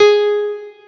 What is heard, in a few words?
piano